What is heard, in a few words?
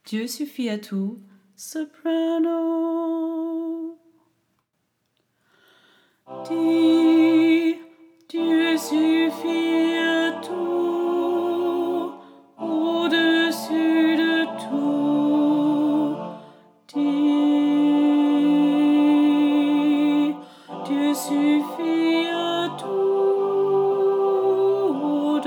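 soprano, SATB, French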